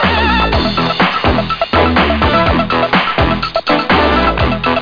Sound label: Funk; sblaster